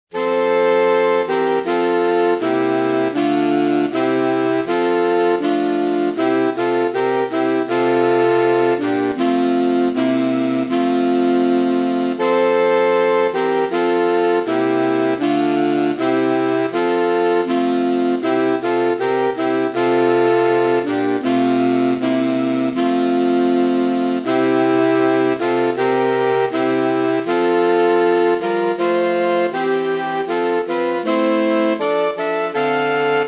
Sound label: hiiragikazarou sax